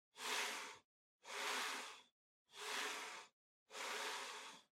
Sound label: PC-Mouse-Move (Far-Hard Pad-Long)